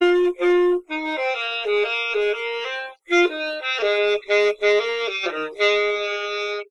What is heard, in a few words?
login sax mock; Fedora